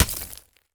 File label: ice camera